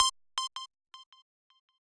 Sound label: lead